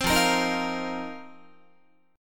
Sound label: F 7th Suspended 4th F down Guitar; strummed